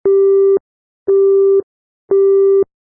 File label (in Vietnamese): tieng tut tut tut cua dien thoai